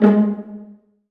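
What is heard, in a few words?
Viola Pizzicato G#2; Digital Sound Factory Virtuoso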